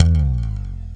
P BS SLIDE E3, PRECISEBS; Drums In Your FACE!

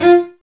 STR Vc Attack; Roland L-CD702; Vc Marc&Harm

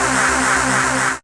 RAVE, RAVESYN

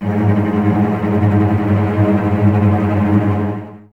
cello G#2 tremolo, String Ensembles, Cellos; Miroslav Vitous

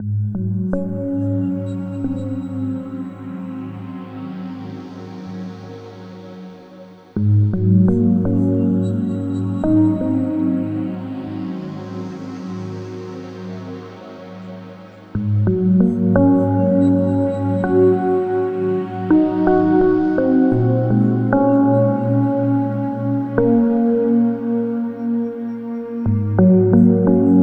Ambient Keys; Sonic Foundry (Sony Creative Software); Slow Silhouette

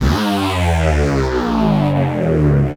173 Bass, Drum n Bass

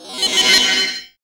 FX Dance

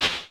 CLAP, Vermona Mono15 Drums

